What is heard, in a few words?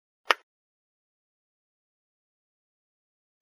clack